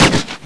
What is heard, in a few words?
SILENCER